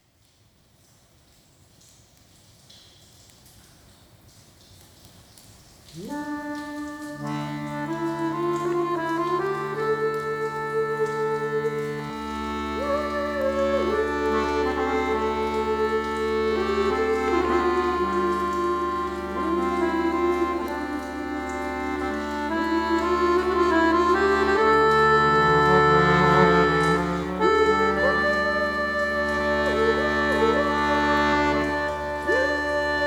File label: Cave Calm